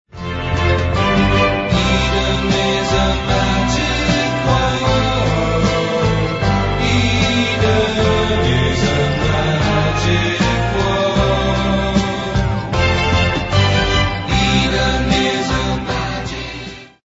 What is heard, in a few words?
orchestra; telefunken; pop concerto